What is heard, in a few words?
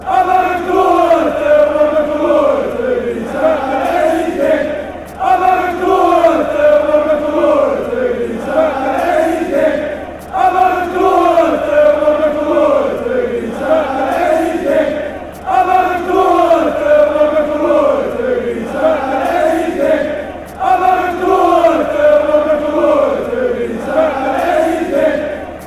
beerschot chant